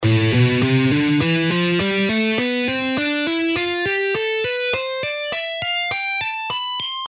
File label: Guitar fretboard exercises